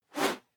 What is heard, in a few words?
whoosh whistle; swooshes-swishes